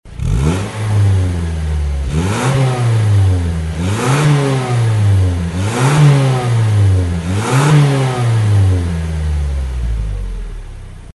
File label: Mazda Biante CC Muffler Audio; AutoExe